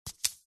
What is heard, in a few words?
foley card beer mat set down and slide on table; zapsplat